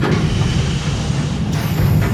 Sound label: centrifuge; factorio 2.0.55